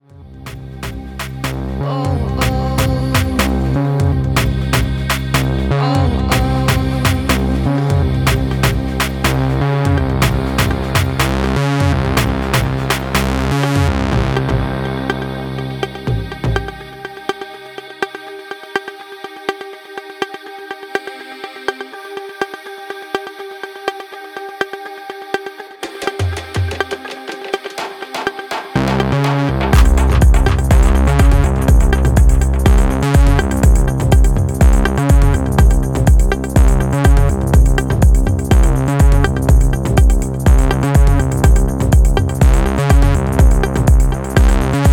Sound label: african techno mix